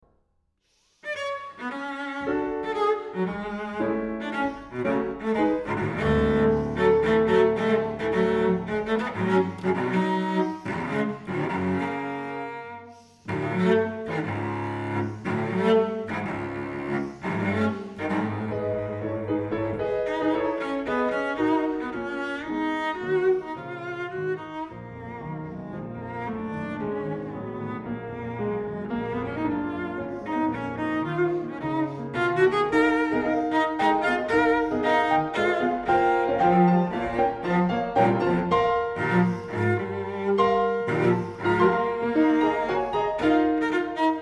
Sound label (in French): voix desert moderato; Ernest Bloch; Voix dans le désert